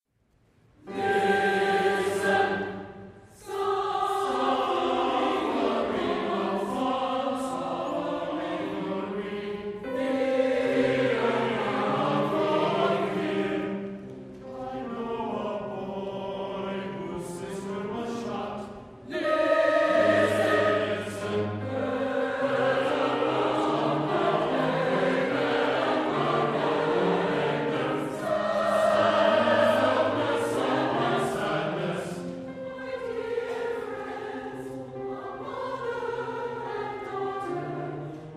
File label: Choral Response